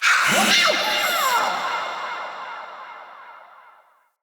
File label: Hallow Spirit Vocal Death; Halloween